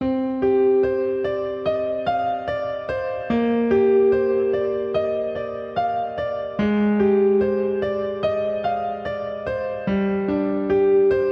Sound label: Piano